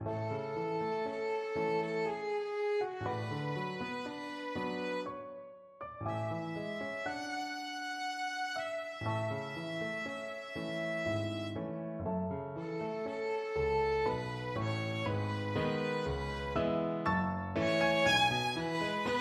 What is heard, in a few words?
puccini donna violin